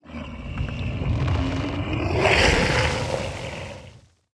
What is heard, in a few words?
stone idle